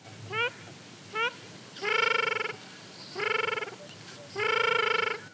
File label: Tadorna ferruginea male